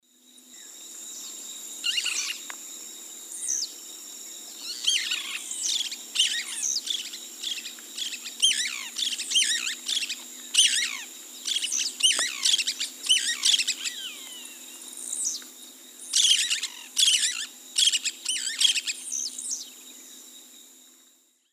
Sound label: Megarynchus pitangua, calls pair; S. America; Eastern Lowlands